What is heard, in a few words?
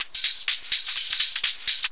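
drum loop 125, hh+filterloops; PS-28 Vocal House Samples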